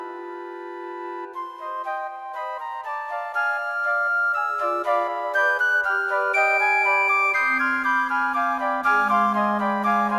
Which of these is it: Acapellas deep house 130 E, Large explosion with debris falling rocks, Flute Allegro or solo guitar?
Flute Allegro